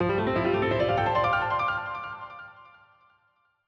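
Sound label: f major arp piano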